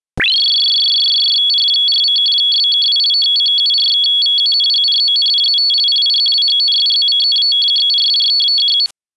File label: sample FSK telemetry chessboard packet; URESAT-1